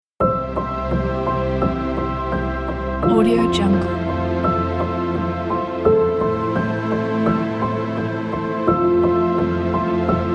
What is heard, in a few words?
inspirational feeling